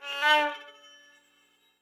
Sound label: Rosin